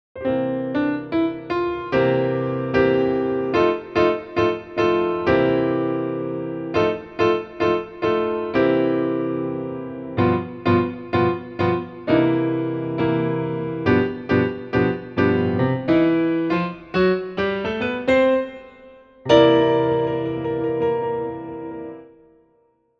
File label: blues